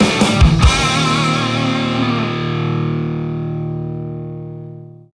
Mission Failed!; The Simpsons Hit & Run; ps2; Halls Balls

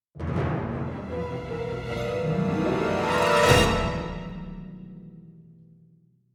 MUSIC STING; lego dc super villains 2018 nintendo switch gamerip; MUSIC LVL1 STINGSOLOMON

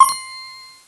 Sfx Choose Patient

